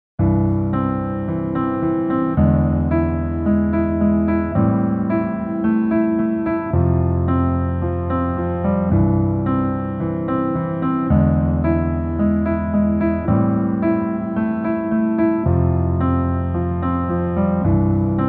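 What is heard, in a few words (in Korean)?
Piano version -1키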